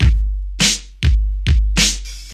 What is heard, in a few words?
68 bpm drum loop sample g key, Free drum groove - kick tuned to the G note.